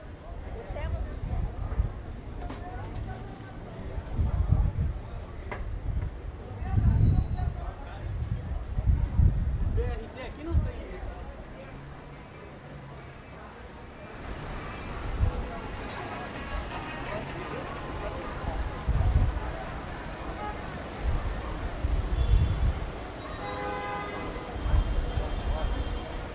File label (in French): Bresil Rio bruit de rue